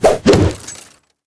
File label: Boss Attack